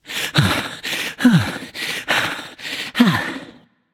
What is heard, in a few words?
nv breath; smite; voicepack; ganesha